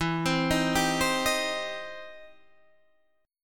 E Minor Major 7th Sharp 5th, E, down, Guitar, Open D